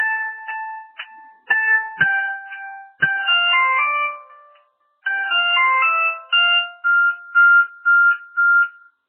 Samsung Tune

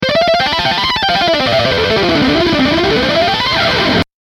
Sweep picking c major

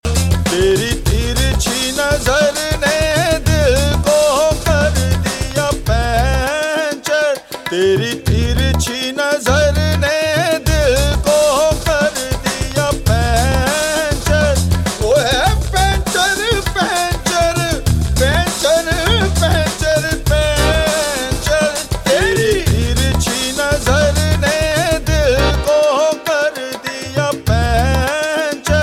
Disco

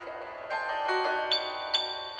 Creepy piano short

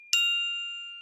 Ting

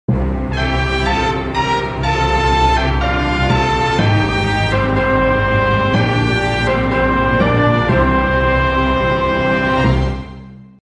Fanfare; Deltarune

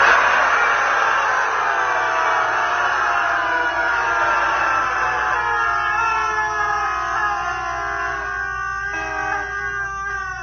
Duck screams

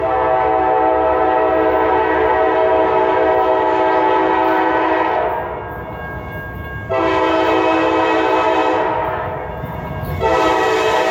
CSX Air Horn

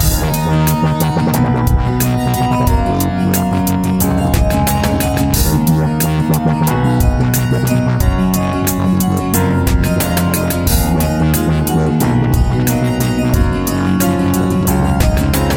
Deep Step

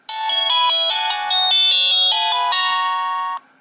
Shutdown

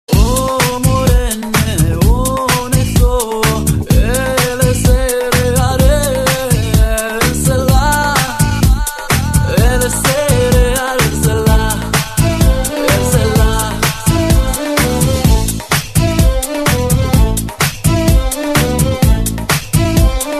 Club Music

